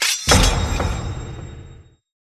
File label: Ui Expeditions Node Button